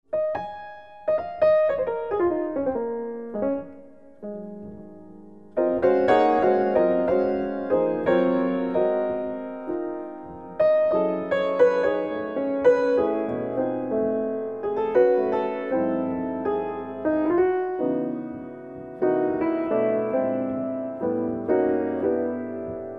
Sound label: beautiful improvisations